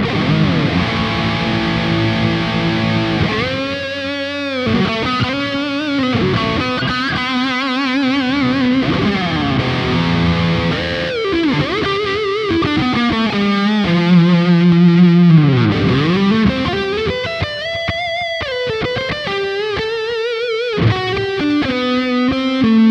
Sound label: Ibanez AZS2200 Prestige Neck to Bridge Alternate Switch down Highgain